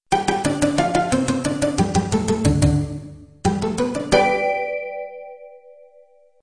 soft

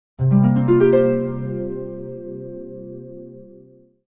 jingle

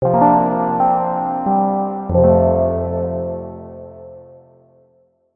jingle

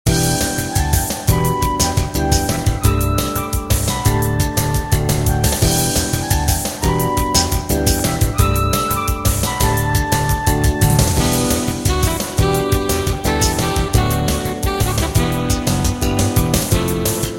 Fairwind loop

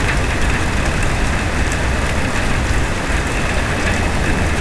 rain

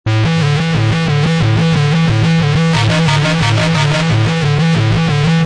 loop

